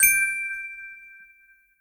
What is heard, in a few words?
chime